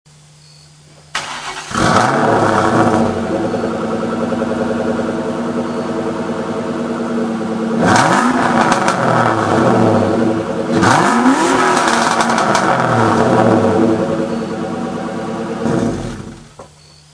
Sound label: stang, o r pipe stock mufflers; mustang